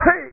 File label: Player Voice Jump; Blast Chamber PSX Final US